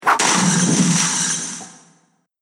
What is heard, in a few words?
Weapon Throw And Destruction; miscellaneous combat